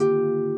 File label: harp